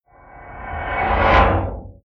Sound Design Reverse Old Rusty Hatch Closed Stinger Horror Eerie